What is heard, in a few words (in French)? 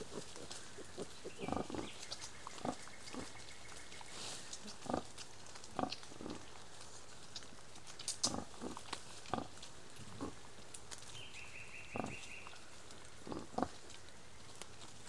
sanglier; pnr gatinais francais